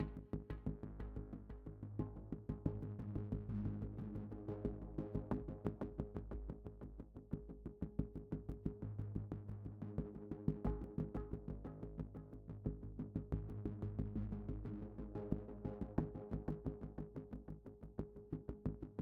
music interactive; World of Tanks

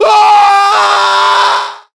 death fall; english; voiceovers; falls

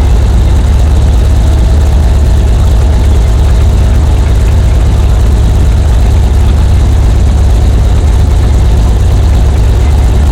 hetzer engine int rpm 33 load, tanks; War Thunder

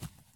soil jump; Escape from Tarkov

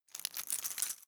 chain belt; Rust

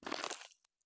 walk gravel; Escape from Tarkov

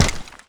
grunt dirt run

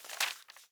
ui drop paper; Rust

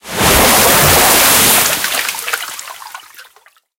enter the water, tanks; engines; War Thunder

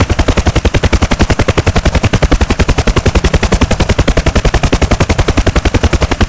rotor UH1H, aircraft engines; DCS

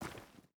footstep boots stones walk; Rust

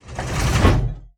Weapons Cannon Reload; DCS

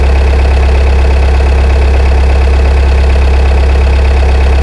Tank Engine; DCS

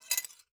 pickup metal; Rust

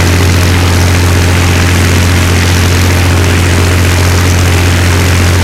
mustang side, engines; War Thunder

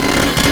idle startup

